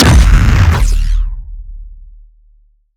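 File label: Sci weapon Shot Large Laser Planet Destroyer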